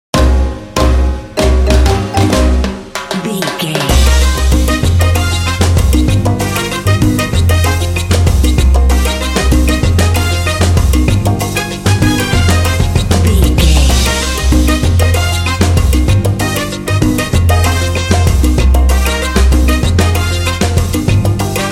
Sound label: hot salsa fire